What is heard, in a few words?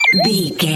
collect item sparkle pop